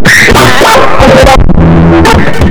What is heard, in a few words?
SCREAM LOOP; Big Beat Samples & More; AKAI; ALL LOOP KIT